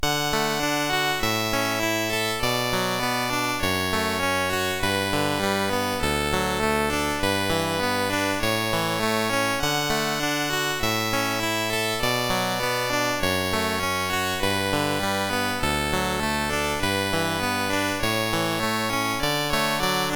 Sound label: Canon, CSG8580R5; Dave Burkus